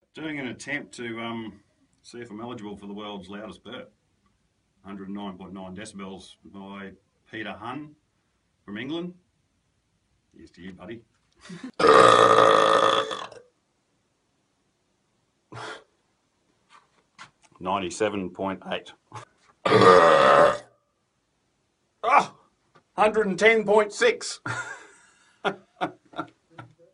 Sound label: man with world's loudest burp; Northern Territory